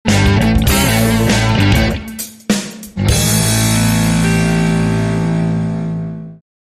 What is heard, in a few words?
Champagne Funk, Sting